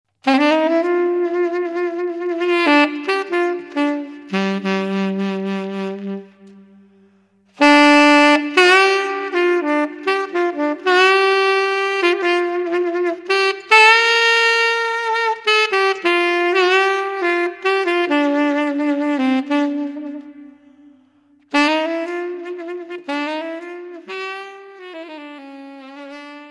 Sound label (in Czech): Vzpomínky saxofonu na mládí